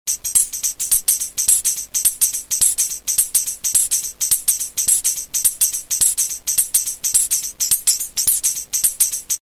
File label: Swing Tmb 106; Roland M-DC1